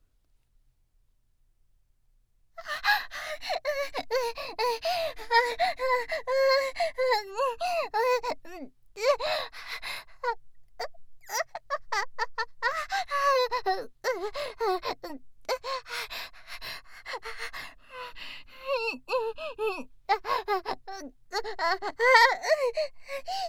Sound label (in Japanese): 夢中になる喘ぎ